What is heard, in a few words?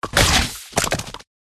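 Scolopendra attack MID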